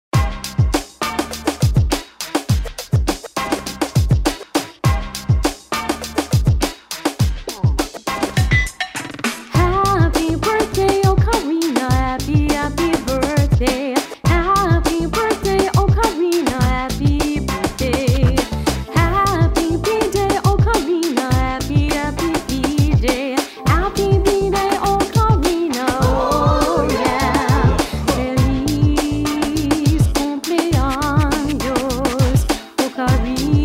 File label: Ocarina